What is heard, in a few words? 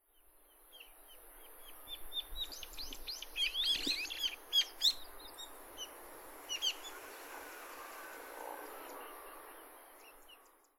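hiyodori, Brown-eared Bulbul; saitama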